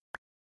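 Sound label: pickup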